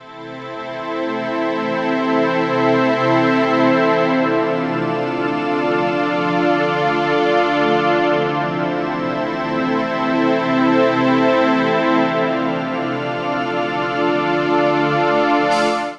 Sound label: Musical Loop 120 Synth Strings C Major; Ghosthack